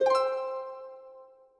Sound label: progress up